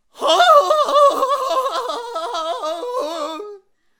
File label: Jouster Attack Vocals